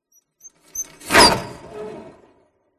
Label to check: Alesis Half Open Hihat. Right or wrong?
Wrong